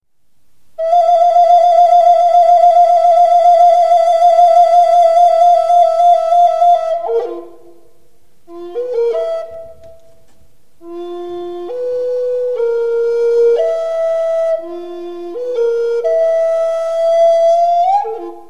flutes a bec

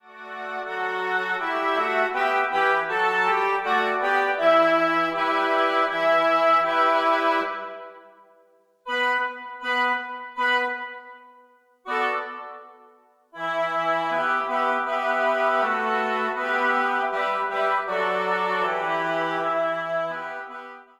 Traditionnel Kis kece lanyom La jeune fille en blanc 3 hautbois

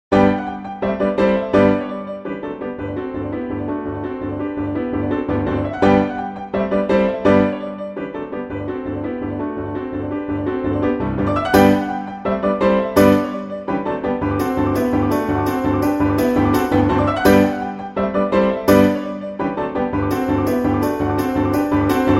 Trepak piano 8 mains; Tchaikovski; Casse-noisette

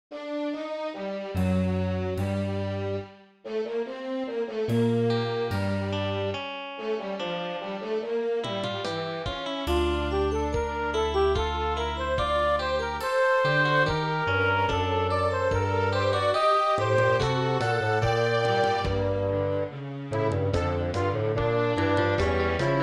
orchestre; Fugue N°16 en Sol mineur

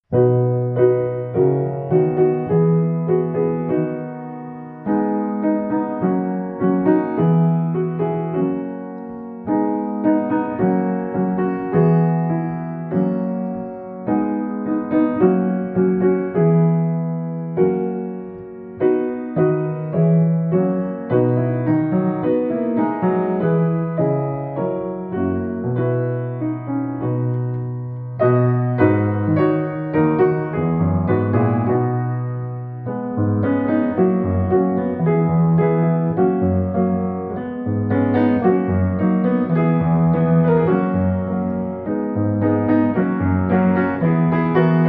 Lullaby piano solo

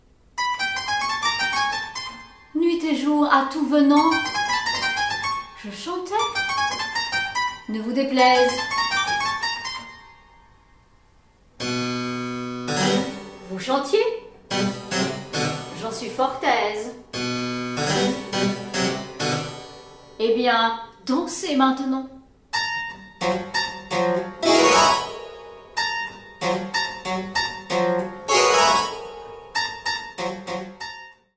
La cigale et la fourmi clavecin

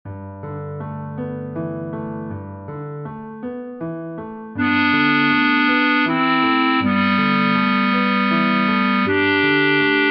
2 clarinettes et piano

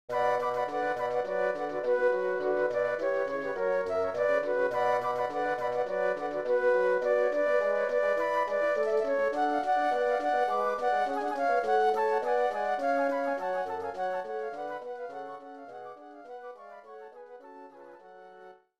3 flutes basson; Badinerie

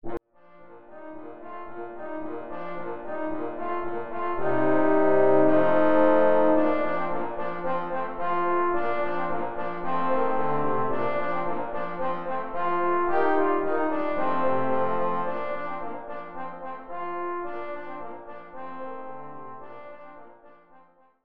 6 trios de trombones

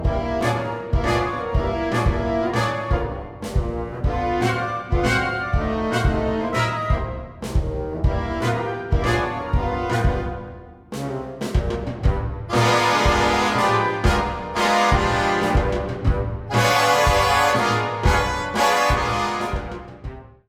orchestre d harmonie

